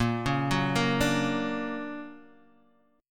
D Augmented Major 7th Bb, guitar

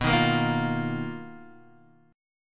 B-Major Sharp 11th B down Guitar Open D

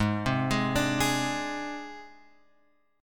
G sharp Major Flat 5th, chord; G#Mb5 Chord {4 3 6 X 3 4} arpeggiated

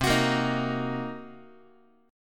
C Minor 7th Flat 5th Guitar, chord; Cm7b5 strummed; JGuitar

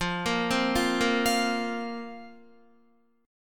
F Suspended 4th F down Guitar; Fsus4; arpeggiated; 4E,4A,5D,5G,5C,6F

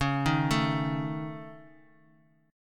Db Suspended 2nd Sharp 5th Db, chord; arpeggiated; Dbsus2#5; JGuitar

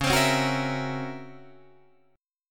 Db Minor Major 13th Db Guitar DADGAD; strummed; 11,15,11,11,13,14

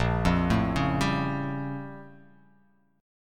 Bb Minor Major 13th Bb; BbmM13; arpeggiated; x,1,3,2,1,2,x,x; JGuitar

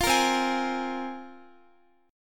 C 7th Suspended 4th Sharp 5th, C, down, Guitar; C7sus4#5; strummed; 4E,4A,5D,5G,5C,6F

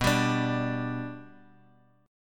B-Minor Sharp 5th, Guitar, Open G

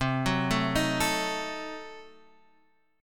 C Diminished 7th, guitar chord; Cdim7 Chord {X 3 4 2 4 5} arpeggiated

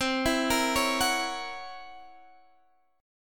C 7th Flat 5th Flat 9th C, chord

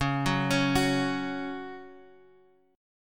Gb 5th Db, guitar

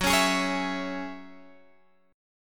F sharp 7th Suspended 2nd, Guitar, Open G; x,11,11,13,14,14

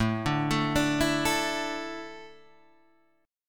A Suspended 4th

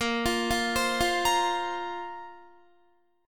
A sharp Suspended 2nd down Guitar; A#sus2 arpeggiated

